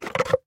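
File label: handheld vacuum cleaner sounds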